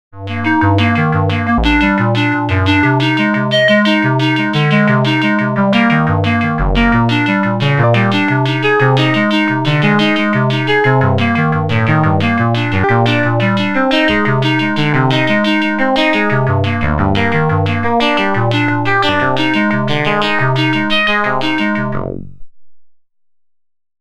Chord Bass; Dreadbox Polyend Medusa

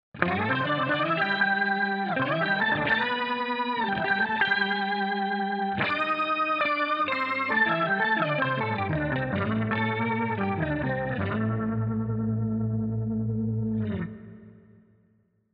Tonewheel Organ

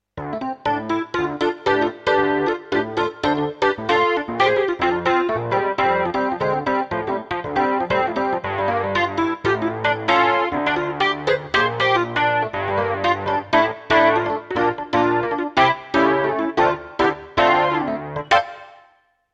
Dyno EP

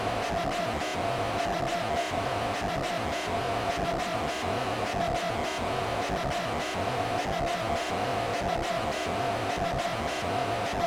Noise Seq; XILS3

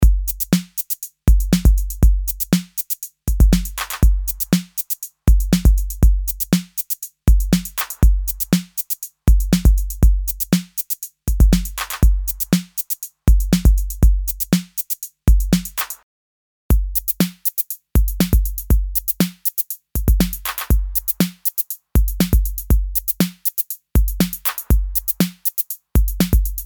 drumloop full strip; softube weiss gambit for console 1 demo

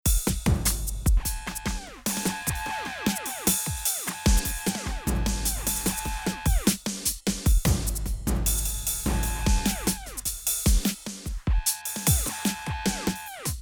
NI Battery 4 neuron kit

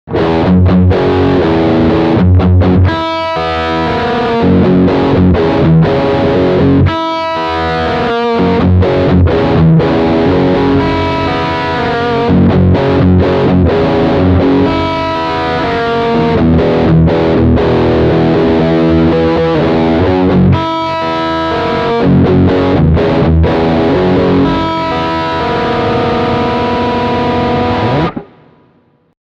Riff Bridge Humbucker

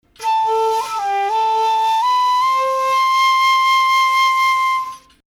Flute more Breath

